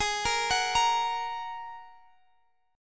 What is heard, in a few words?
Ab 7th Suspended 2nd Ab; x,23,20,23,23,x; arpeggiated